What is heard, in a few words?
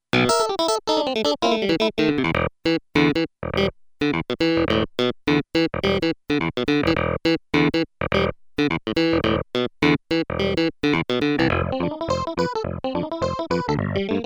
Clavinet Elec Piano Drwbr Orgn; Clavinet Elec Piano 1 Drwbr Orgn 1 demo song